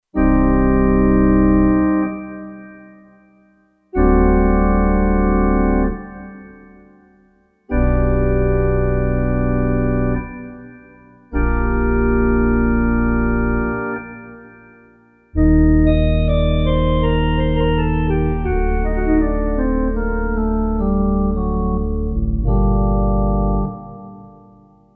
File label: Pedal Pipes, Kurzweil K2500X; Factory Programs